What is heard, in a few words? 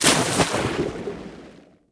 Splash